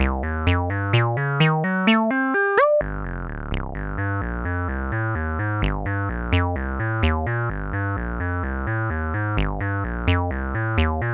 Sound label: sqr